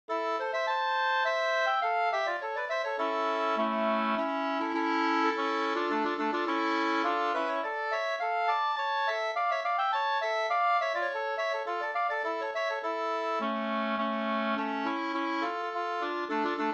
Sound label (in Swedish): polska, 2st; Bergspolskan e Hultklappen Hs